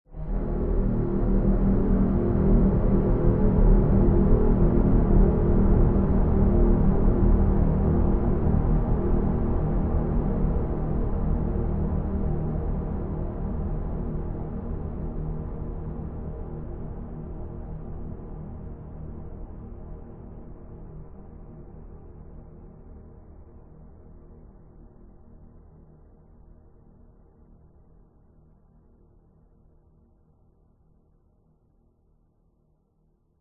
horror swell drone long dark raspy buzzing sinister dramatic; zapsplat